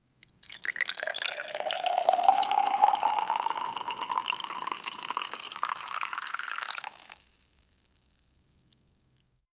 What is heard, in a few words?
pouring liguid into a cup